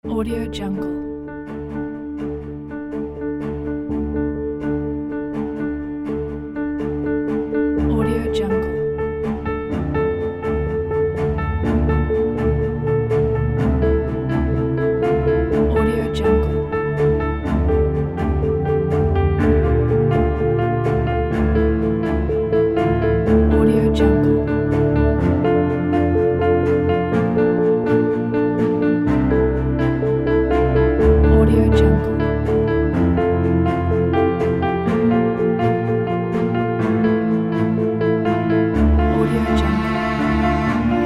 emotional sentimental piano and strings

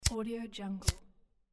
lighter

